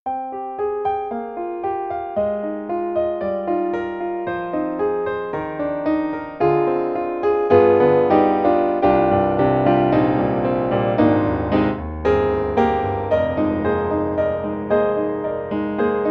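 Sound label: Grand Piano; Roland; SRX-11; XV-5050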